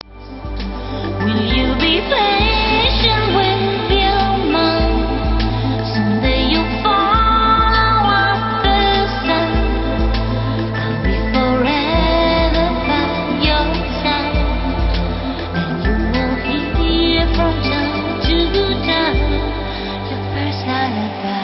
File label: lullaby